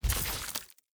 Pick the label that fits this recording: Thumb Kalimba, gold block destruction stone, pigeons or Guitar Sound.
gold block destruction stone